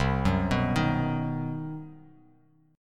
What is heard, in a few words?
C Minor Major 7th Flat 5th chord, Bass Standard; arpeggiated; JGuitar